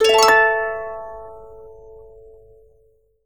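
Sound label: harp; Best Service; Peter Siedlaczek's Advanced Orchestra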